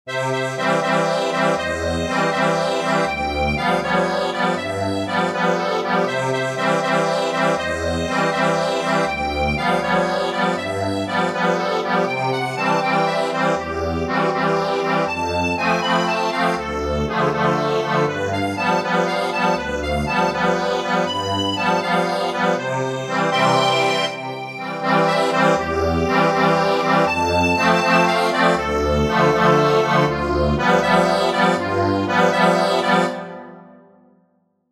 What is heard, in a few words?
accordion